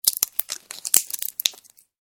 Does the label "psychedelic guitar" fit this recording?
No